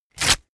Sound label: sheet crumpled tear up fast short